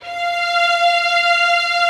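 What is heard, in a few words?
Violin Legato F4; Digital Sound Factory Virtuoso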